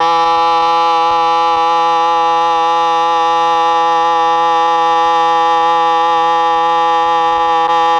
MEL OBOE

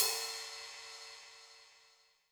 ride, Zoom MRT-3B

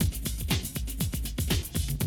120, Classic Drum Loops

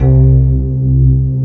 SYNT C2, SYNTH; AKAI S-Series CD-ROM Sound Library VOL-2

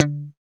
GTR Funky Strat, Funk & Mute; Club-50 - Foundations

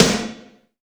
NASTY SNAR, Drum Mashines, SR-16 DRUMS; EdgeSounds

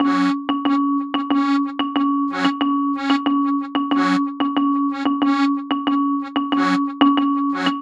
Vibes Harmonium Combo; Sonic Foundry (Sony Creative Software); Cinematic Funk Urban Tone Poems

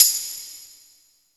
VERB L; East West - Phat & Phunky

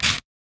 Paper Sharp Tear, household rhythm samples Hits

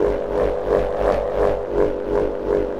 Wind Wand Drone, Ethnic Percussion; Sonic Foundry (Sony Creative Software); Ambient Atmospheres & Rhythms

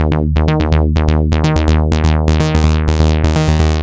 Tech Bass; Sonic Foundry (Sony Creative Software); Underground UK House & Electro

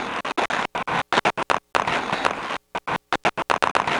Crunchy, Beats

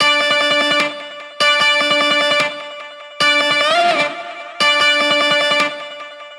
150BPM Guitar Clean D; Antidote Advent

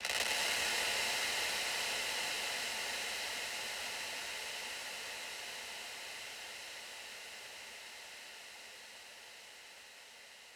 FX Impact; 99Sounds; Monosounds

